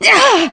Damage Fly; Zero Suit

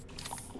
Toughness Pickup Sound

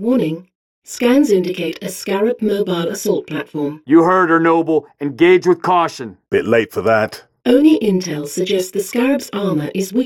Scarab Fight; Halo Reach

